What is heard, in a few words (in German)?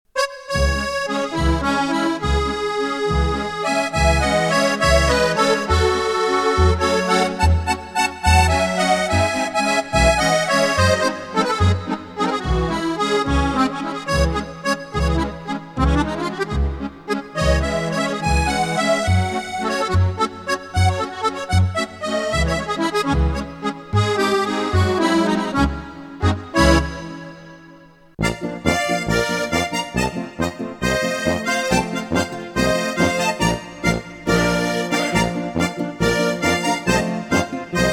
Akkordeonbeispiele; VIVA MUSICA Fachhandel